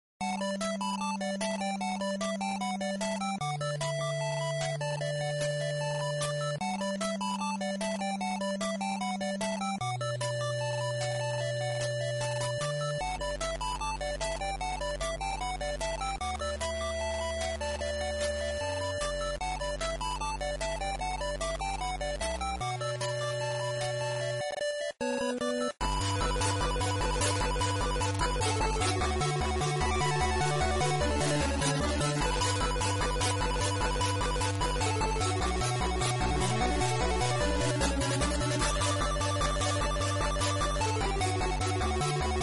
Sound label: 8bit